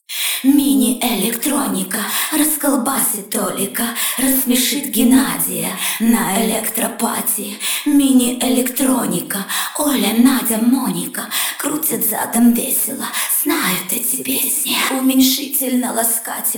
Мини Электроника acapella Вокал

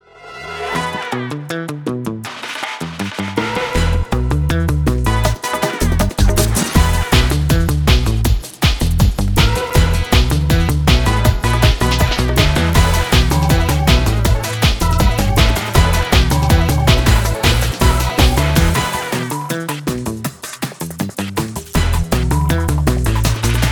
Музыка для Рекламы; Kcell TVC Music 30'

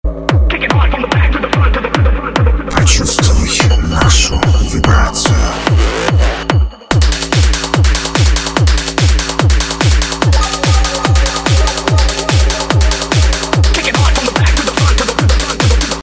Звучание джингла на треке

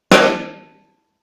Удар барабанной палочкой

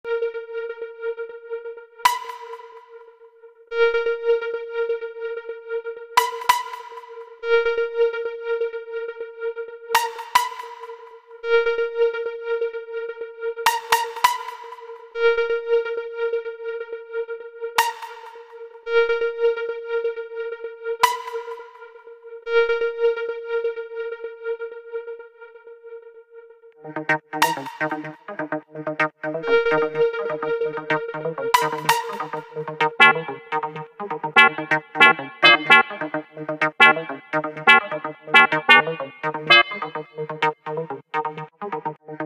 Минус Bass House

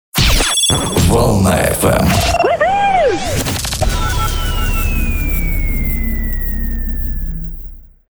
джинглы для DJ (радио шоу)

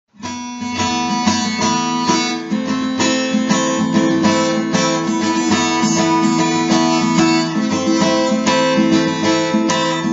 Гитара, сэмпл 95 Bpm

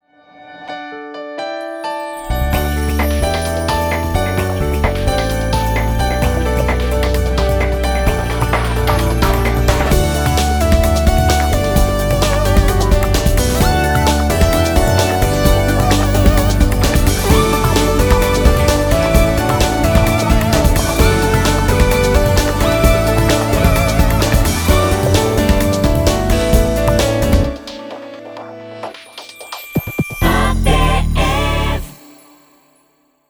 Музыка для Рекламы; TVC Music 30'; ATF

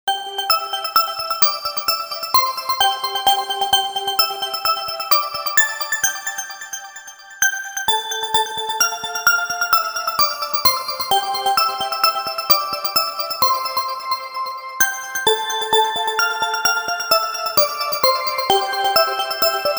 Новогодний рингтон

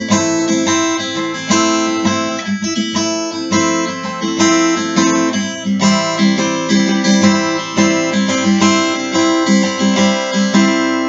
Сэмпл Гитара

